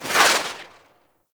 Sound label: Entangle Projectile Pass By; Warframe; Oraxia